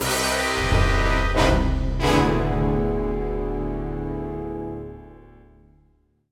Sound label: Boss End; bolt 2008 pc gamerip; Disky Boss End